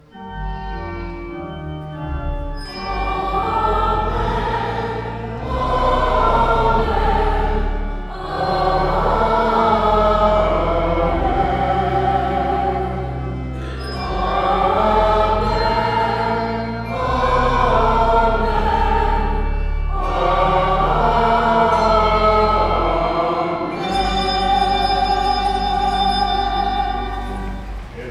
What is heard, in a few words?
Amen; StJ bells